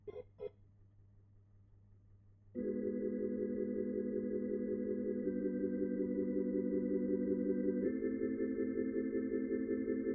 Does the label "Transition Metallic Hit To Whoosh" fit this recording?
No